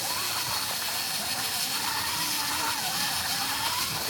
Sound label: hand launch cable; Extendo Hands